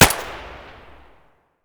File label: p226 fire distant; Escape from Tarkov